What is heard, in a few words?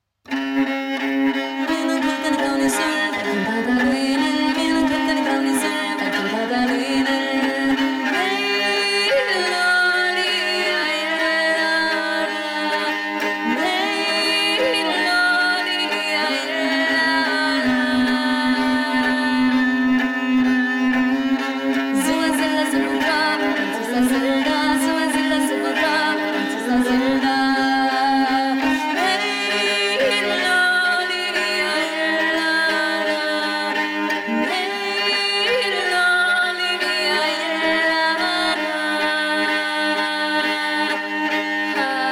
finnish song